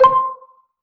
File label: emote; CosmicRage